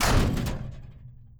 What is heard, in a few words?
step; brainrot; ferro sahur